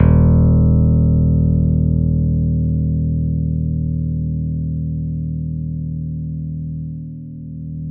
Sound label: D#1 MP, Piano cp80